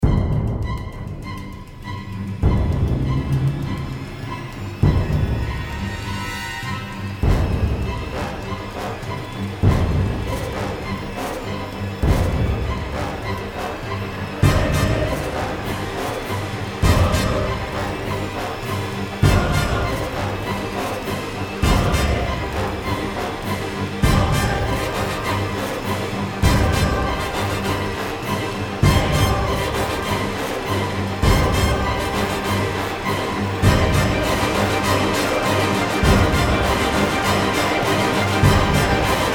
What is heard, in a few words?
dramatic riser